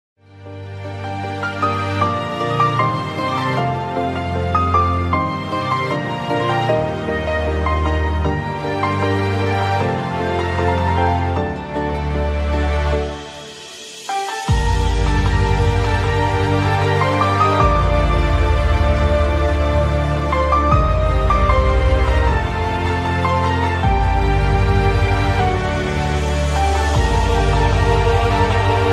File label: bollywood instrumental ringtone; dhadak